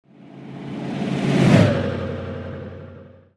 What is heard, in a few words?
1959 corvette; Car SFX